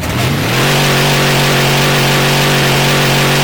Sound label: reverse, truck; brrr truck; sentry; manster